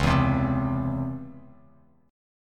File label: C sharp Minor Major 7th Bass Standard; C#mM7 strummed; 9,7,10,5; JGuitar